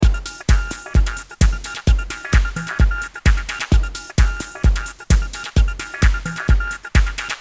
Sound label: Beat - Slapping Noise; CRTIV Shumovick